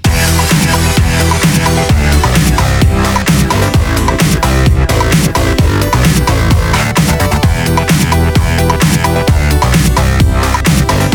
Bass back drop

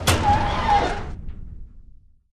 trunk open, doors

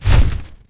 MINOTAURUS STEP; Emperor - Battle for Dune

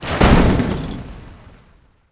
assault tank; Emperor - Battle for Dune

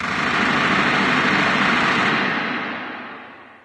city battle, streetwar; ambient, levels

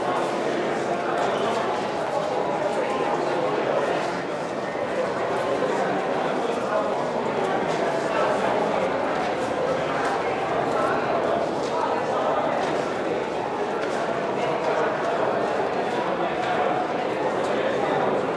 Crowd Indoor; GrandFantasia

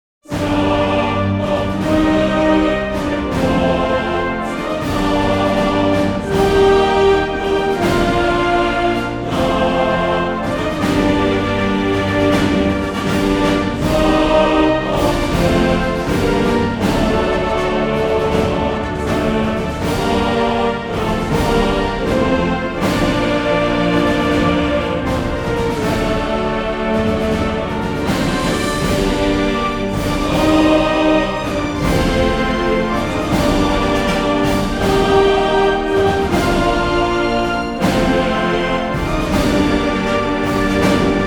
soviet victory; Hearts of Iron IV Death or Dishonor

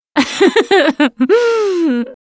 laughter

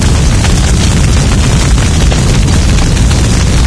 interior fire close mono; ambient, fire

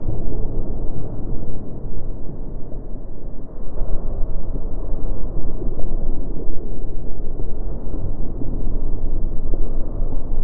AMB Hive; Duke Nukem Forever

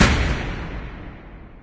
door metal large chamber close; Garry's Mod; hl2

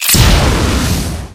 electric explosion; Garry's Mod; hl2; labs

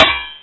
clang metal; Mount&Blade Warband

